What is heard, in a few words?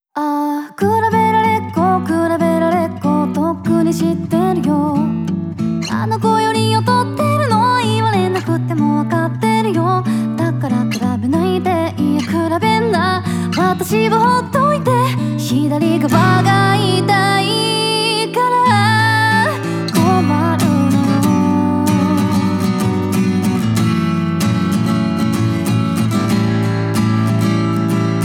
Acoustic arrange ver.